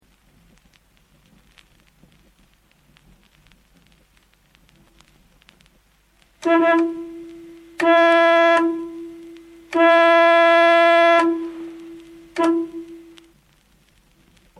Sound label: record hooter